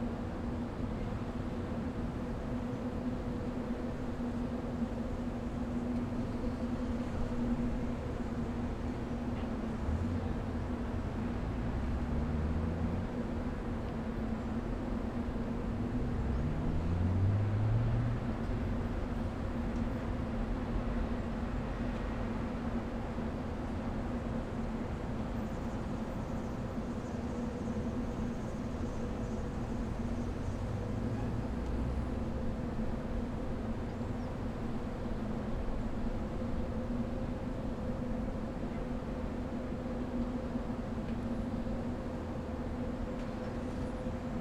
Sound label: rooftop